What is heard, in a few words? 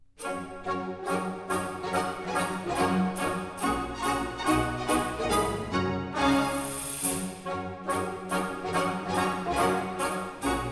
Tambourins; Rameau; Suite from Platee